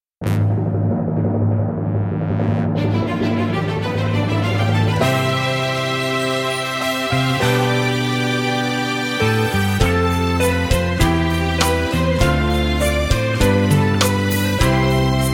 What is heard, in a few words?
Medley No Backing Vocals